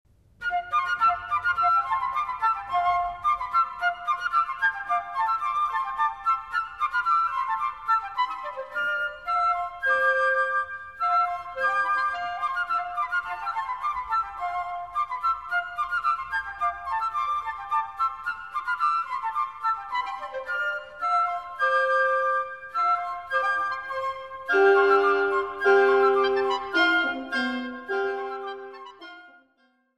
Instrumental Miniatures Allegretto

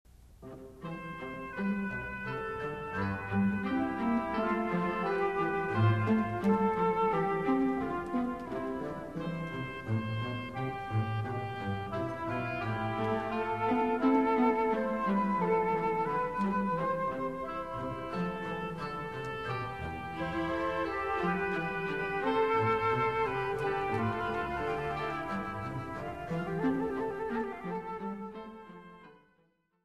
Chorale variations; musique religieuse

